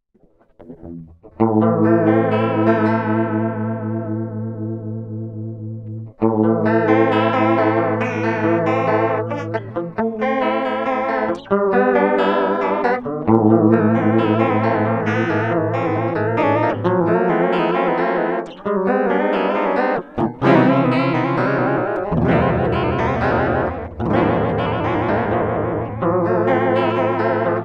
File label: Chorus